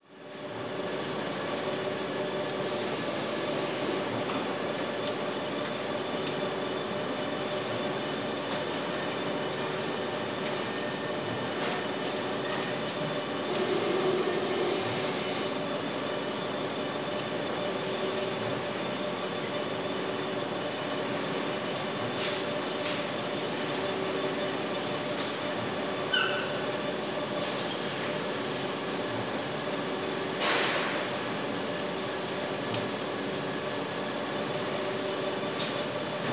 tyre factory floor factory ambience tyre batch wrapping unit